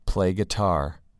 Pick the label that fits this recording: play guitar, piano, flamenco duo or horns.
play guitar